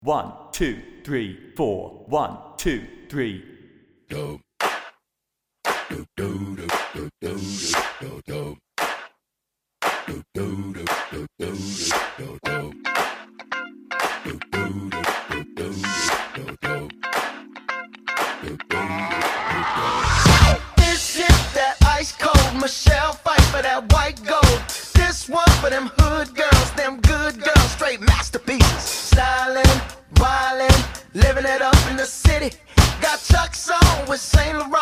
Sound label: Brass Play Along